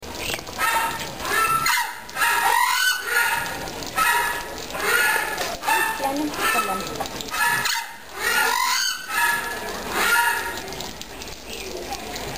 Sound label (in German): tschaja laute